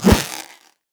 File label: infected cleaver; mundo